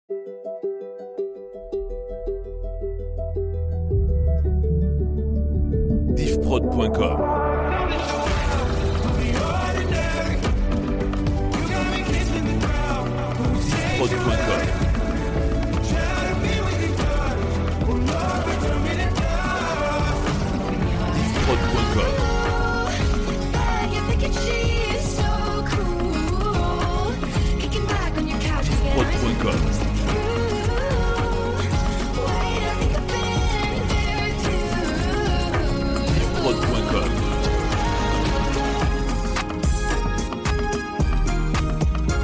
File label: Medley Hits 110 BPM; Diffprod